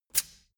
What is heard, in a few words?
tire pressure control release